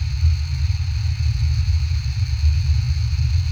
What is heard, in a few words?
gas escaping; Soldier Of Fortune II Double Helix; XBOX; ambience